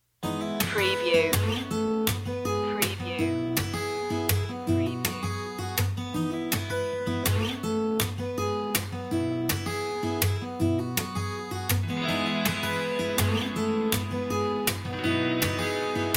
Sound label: no vocals